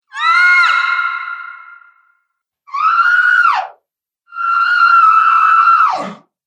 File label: Female Monster Scream Collection